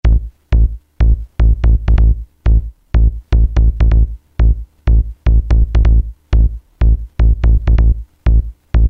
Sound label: House Bass Line, Roland MC-303